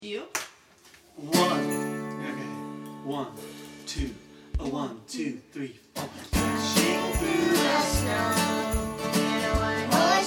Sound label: Jingle Bells